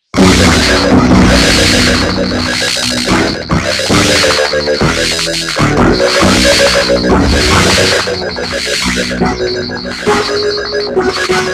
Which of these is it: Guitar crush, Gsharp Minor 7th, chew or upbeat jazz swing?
Guitar crush